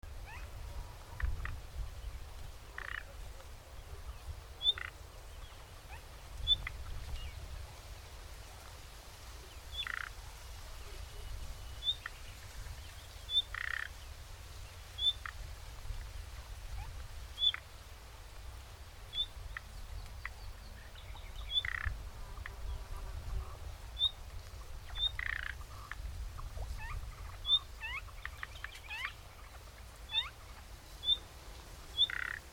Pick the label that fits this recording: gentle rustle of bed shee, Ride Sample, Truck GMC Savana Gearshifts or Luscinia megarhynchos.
Luscinia megarhynchos